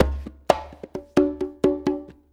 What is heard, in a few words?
Congas, Perc Loops; Sonic Foundry; Drum Tools